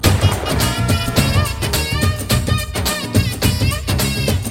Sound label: Flute Sms